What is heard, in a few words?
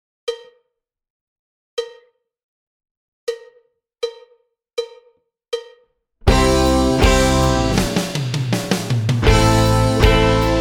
Uke Scoop